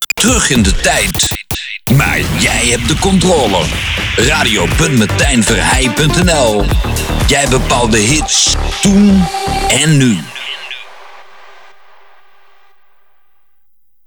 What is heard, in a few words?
Jingle